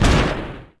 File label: Tank Hit Short; Civ4 warlords